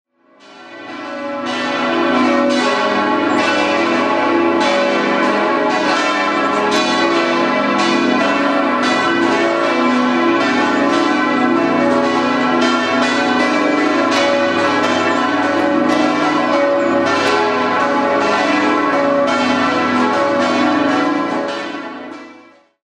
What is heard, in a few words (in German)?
Kirche